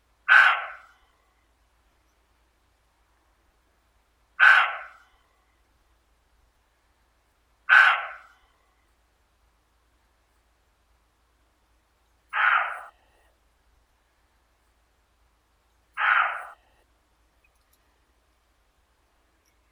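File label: European roe deer, Capreolus capreolus; kosulya evropeiskaya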